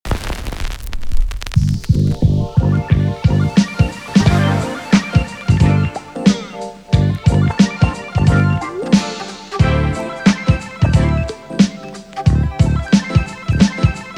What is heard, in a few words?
Hip Hop; Black Octopus Sound; The Golden Hip Hop V2; Audioflair; rnb